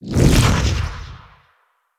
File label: Staff Heavy Proj Whizz By; Weapons; Enemy; Staves; Mnd Wazard Staff